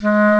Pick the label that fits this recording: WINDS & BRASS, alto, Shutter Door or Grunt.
WINDS & BRASS